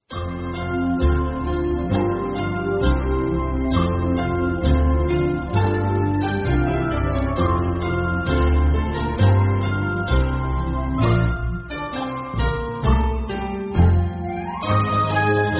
think music

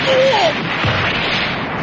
door; paragasu

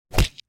small bird attack

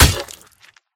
axe hit; carl

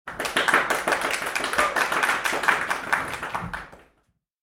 small theatre audience clapping full

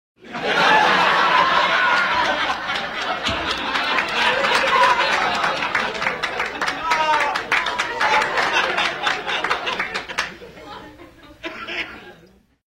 audience laugh tracks

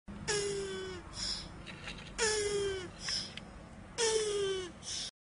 tortoise scream

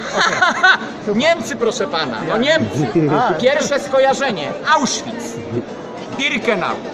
hahaha

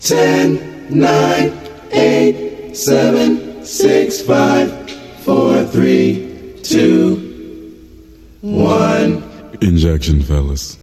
countdown sound; mugen number muncher

